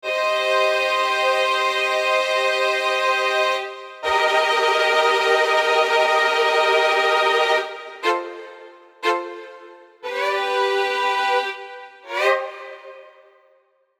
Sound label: Strings Articulations; Logic